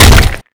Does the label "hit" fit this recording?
Yes